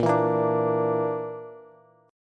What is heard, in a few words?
Bb Major Flat 5th Bb down Bass, chord; strummed; JGuitar